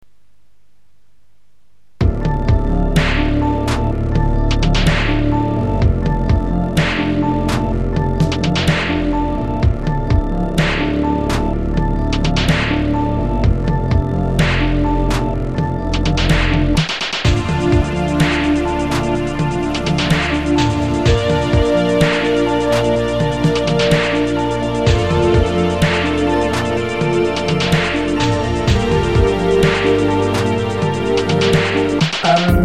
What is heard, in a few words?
blues